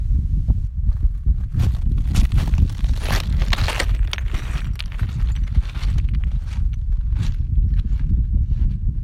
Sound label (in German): lava gesteins brocken